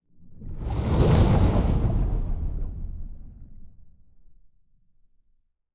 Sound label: titan move; aquatics